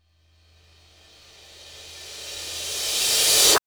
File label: REV CYMBAL; ILIO; Trance Fusion; REV CYMBALS